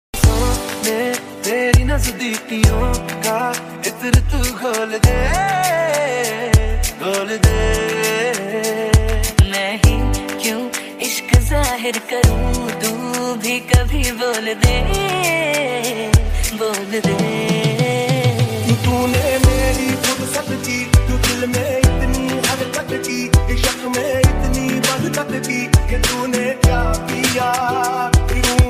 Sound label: bollywood ringtone